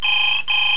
phone; TermSFX

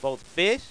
Scale; My Science Fair

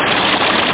rain; extinction

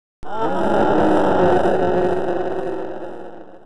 storm end; dungeonmaster; PC World 2004 July & August

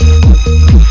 loop

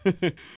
hehe; bloopers

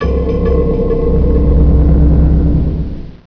Deep Eko Pad

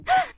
jump; Nejlepší České Hry

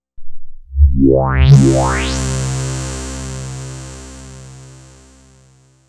doubler, synth

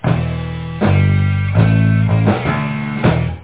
rock

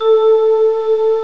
Pad 1 (A3); X-Wing; X-Wing Patches

